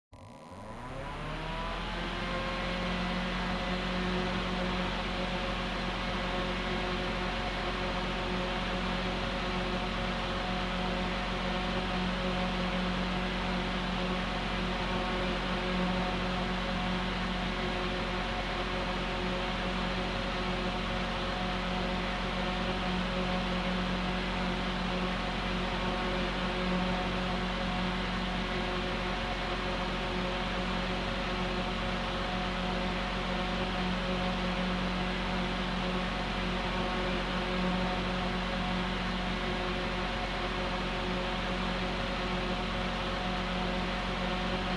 Electric Fan High

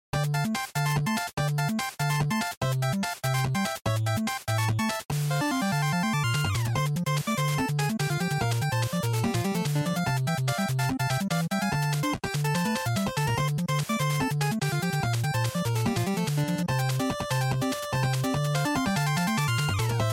NES Action Stage

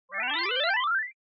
Motion Slide Slow

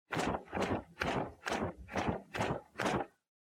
Sensu Fan

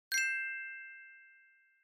Glocken (Multi)

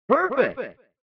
SNES Fighting Perfect

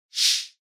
Noise Sweep Short

